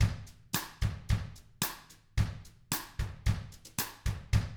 111 COUNTRY; ILIO; Double Platinum Drums 1

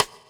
Rimshot; Equipped Music; Loungin House

